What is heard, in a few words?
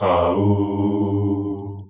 chorus a